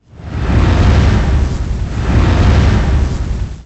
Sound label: fire loop; RYL 2